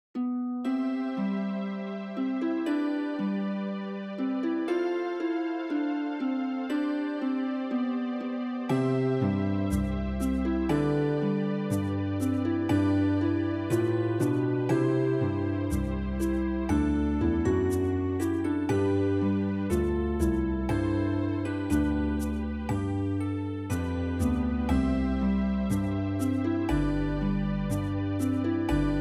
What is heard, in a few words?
instrumental